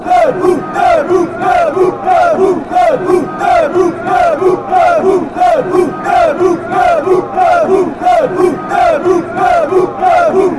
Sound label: chants; stand up; Association Sportive de Saint-Étienne Loire